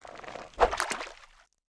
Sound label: throw, fishing; warrior